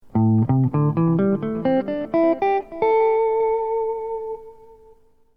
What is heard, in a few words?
a minor pent scale up